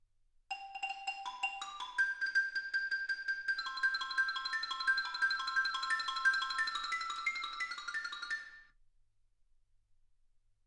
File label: xylophone